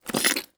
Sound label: Foley Mud Suction